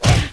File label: Attack; Bali